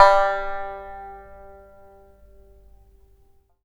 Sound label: SNXUAN G4 MF, Heart of Asia; Spectrasonics